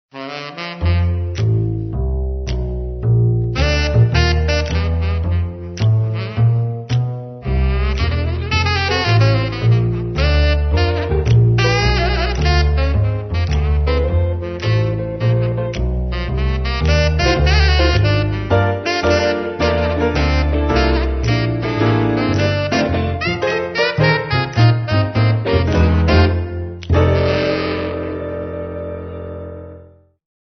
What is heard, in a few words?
jazz music